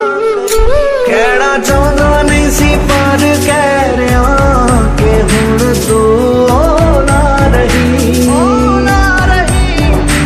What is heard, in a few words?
punjabi sad ringtone